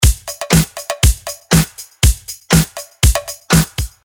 Organic 80s Disco

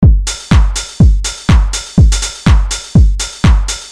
Beat Dissected Detroit Techno

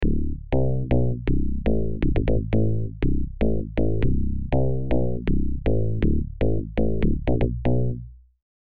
Retro Synth FM

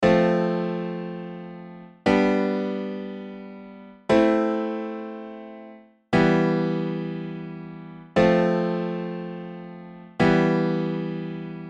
Chords No Ostinato

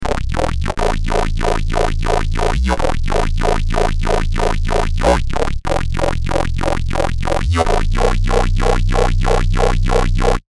bass

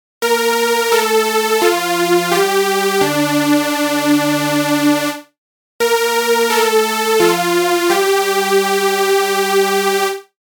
Lead

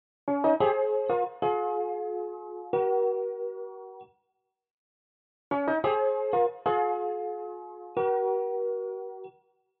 Rhodes

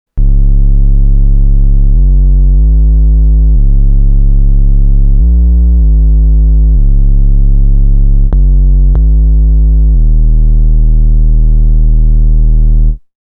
bass sub; Volca Bass

